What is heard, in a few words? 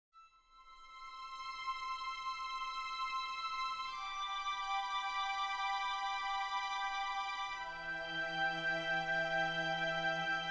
EMOTIONAL PIANO